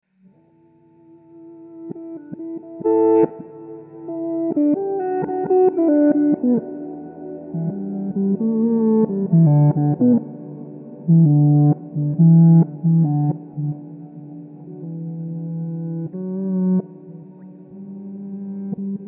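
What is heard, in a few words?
Em 135 guitar loops solos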